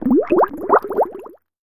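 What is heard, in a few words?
Bubbles Small Surface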